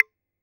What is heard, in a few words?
telephone horn T65; Speakers and telephones; Telephone T65 model